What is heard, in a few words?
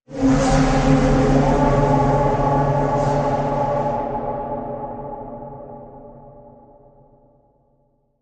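Creature Supressed Torture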